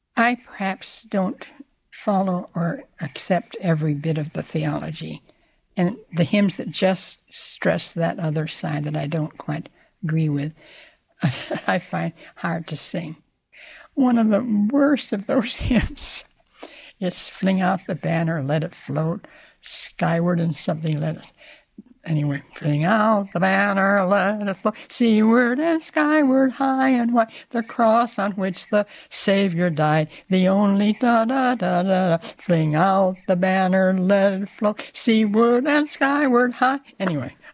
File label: hymn